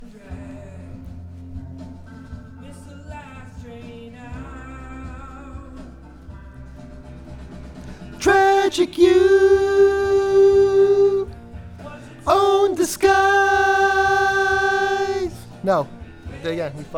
VOX Back

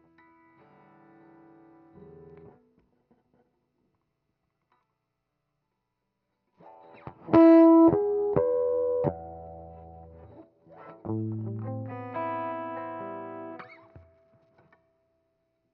Gtr Solo

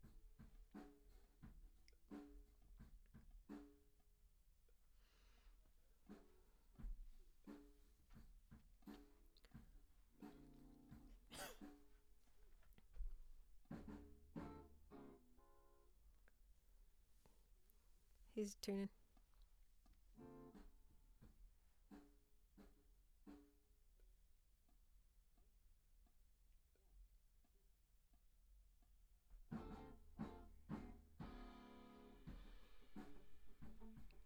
Ld VOX SM7

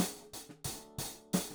Hat

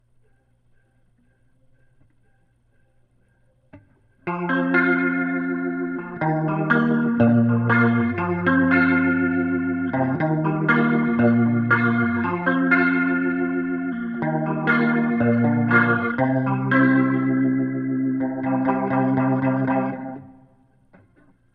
Leslie gtr

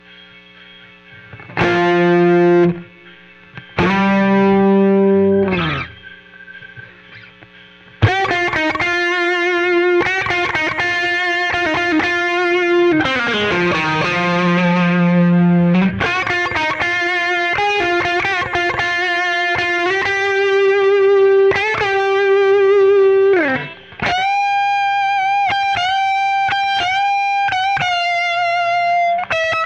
Solo Gtr

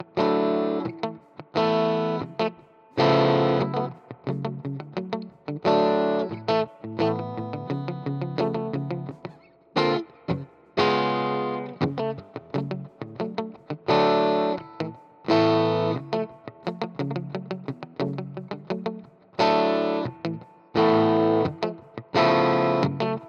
GTR

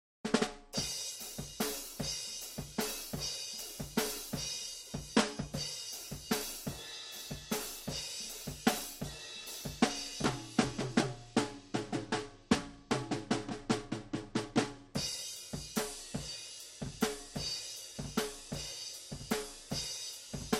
drums

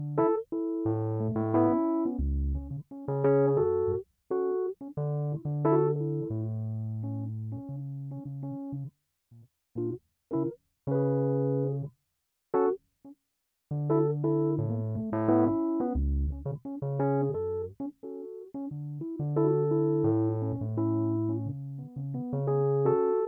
Keyz R

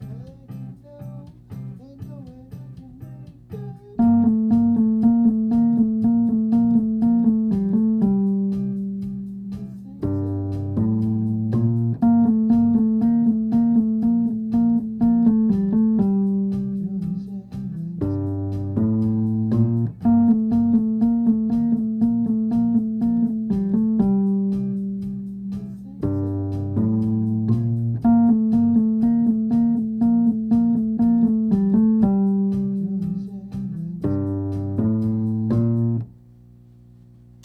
Wurl

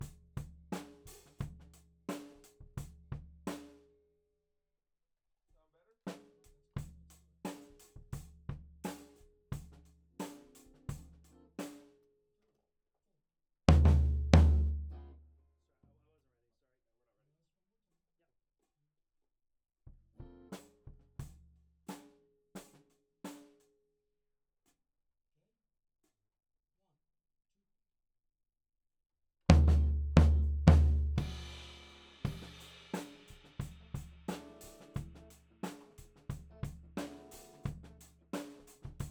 Floor

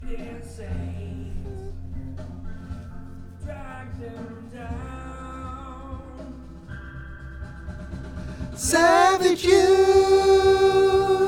VOX Back

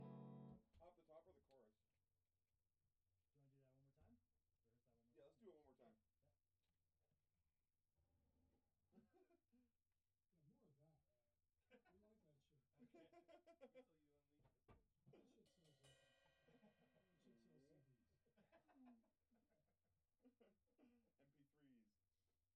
Bass Amp

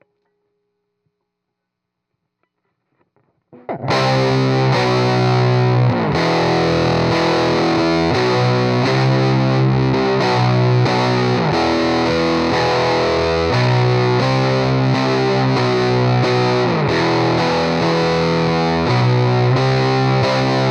Heavy GTR

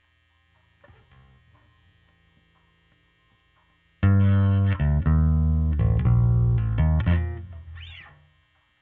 Bass OD

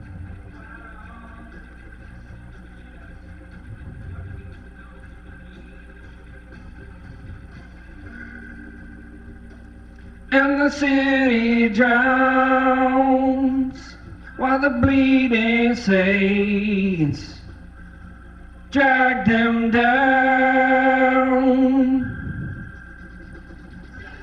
VOX Leslie

